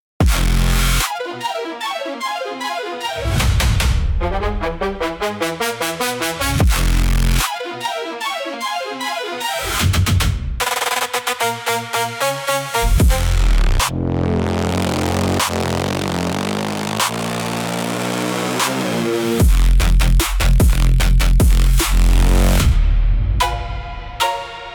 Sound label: Shatter Pulse, aggressive panic for direct fear and jump